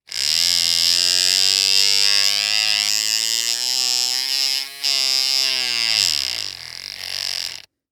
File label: Industry Tool Motor Run Small Hitting Object